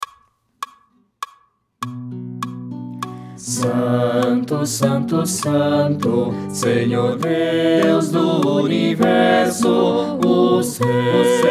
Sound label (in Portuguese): Santo todos; adolfo temme